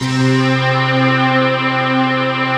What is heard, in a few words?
CYMME C3